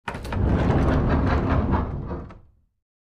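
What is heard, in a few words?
Dresser Rolled On Wood, scrapes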